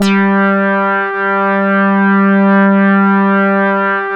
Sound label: HI RES G3, ARP 2600; First Call Vintage Keyboards